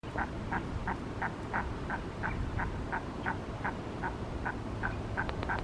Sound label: Female Common Merganser call